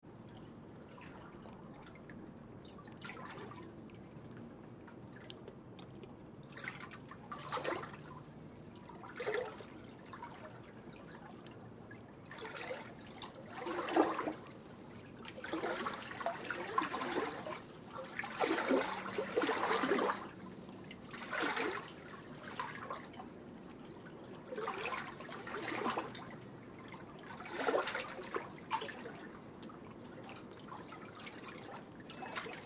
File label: Water Laps Stone Pier In Large Tires; Nature; BigRoomSound